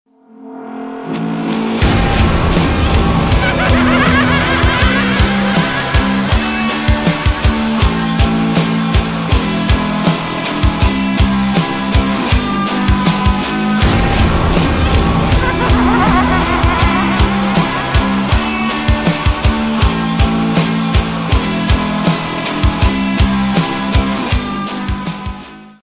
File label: gameover